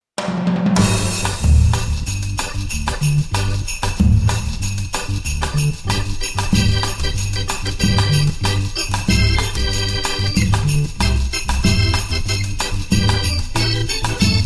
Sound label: Hammond Jingle Bells